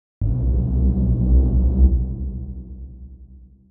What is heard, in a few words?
hollar